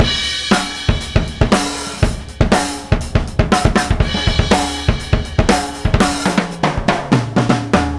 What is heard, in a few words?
120 bpm, breaks; Zero-G Beneath the Planet of the Breaks